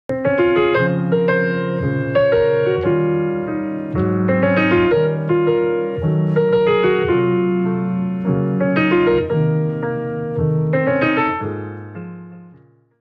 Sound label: Piano Music